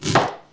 Kitchen Chop Onion; The Princess and the Frog; wii windows; Hub Restaurant; Act Kitchen

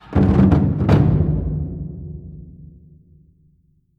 Level Up

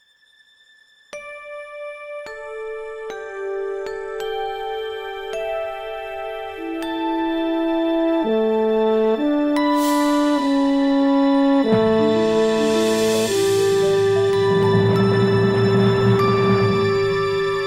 cinematic game intro; stage0

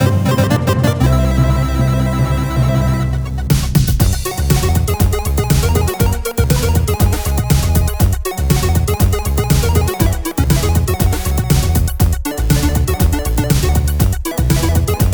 Clear Level

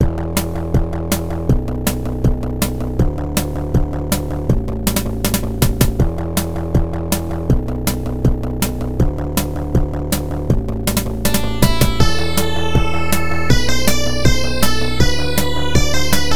Waterfall; dark ages

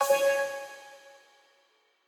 Quasar Clear B; Mixolumia

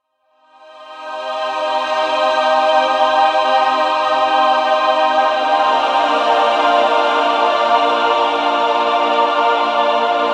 Heaven Gates Ambient; Lost Ruins

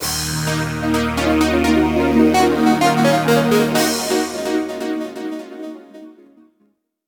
Level Failed

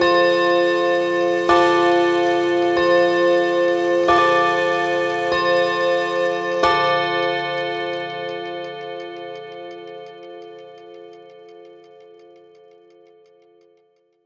BIG CLOCK ALARM; Air Twister

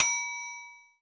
Right Temp; Levels Hub Restaurant; Act Kitchen; Right Temp Ding

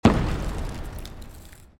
Ice Cream Sandwich Explode

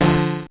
multiplier D4 F4

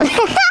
Classic Click Sound; Jolly Battle; Android

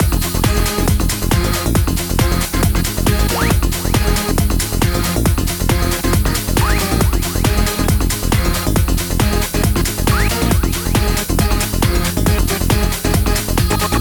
Vs Result Win; DJMAX Trilogy

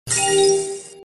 Item Evasion Up; Mighty Knight 2

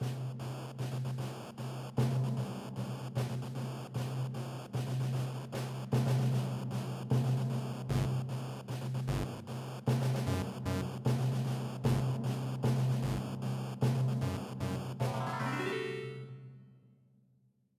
Star Chords

